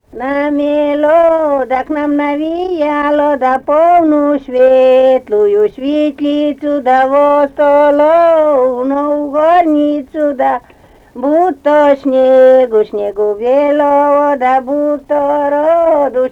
Намело, да к нам навеяло (свадебная), Марьинская

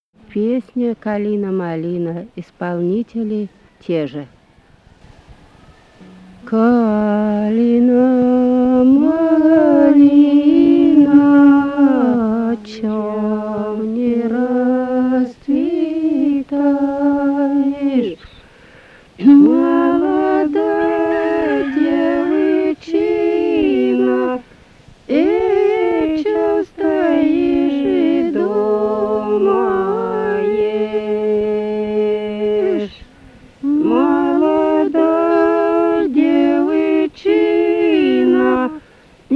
беседная; Калина-малина, чом не расцветаешь; Лобановка

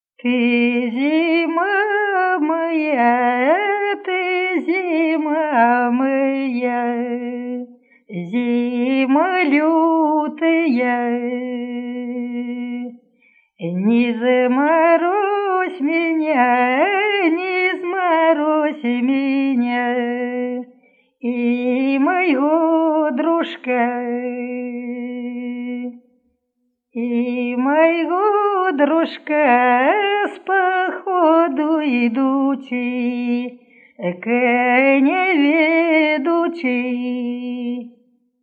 лирическая на масленицу; Ты, зима моя

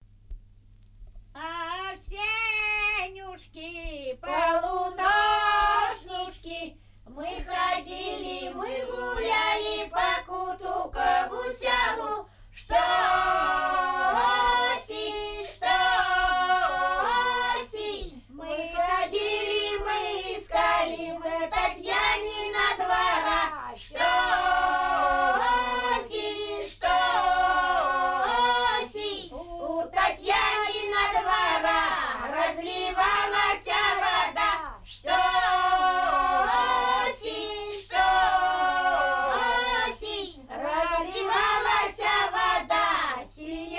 Новогодняя поздравительная; Авсенюшки

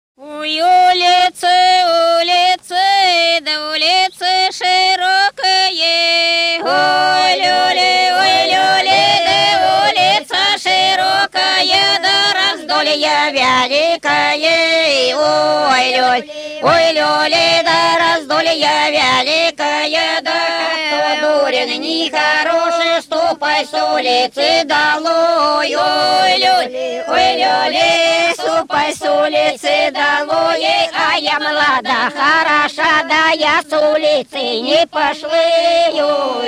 Ой, улица, улица, улица широкая (в карагоде, величальная)

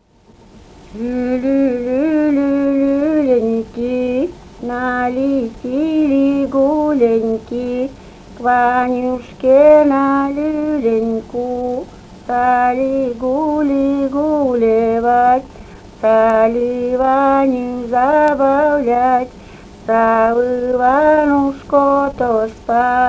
Лелю, лелю, леленьки, налетели гуленьки (колыбельная)

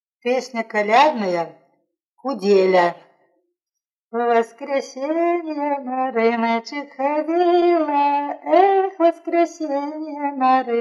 колядная хороводная; В воскресенье на рыночек ходила

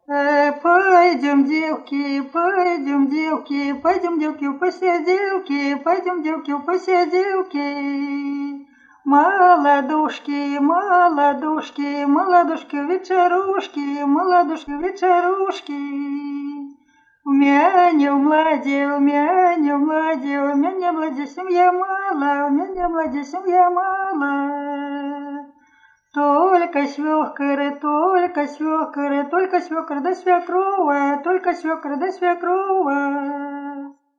колядная хороводная; А, пойдём, девки, в посиделки

